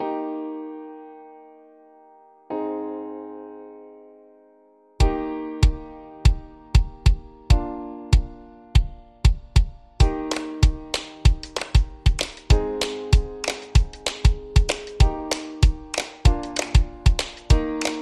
No Guitars

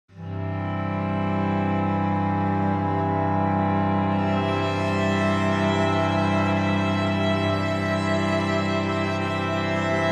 No Wind SFX No Accordion

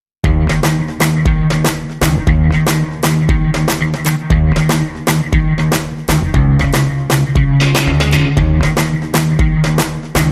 Like A Drum

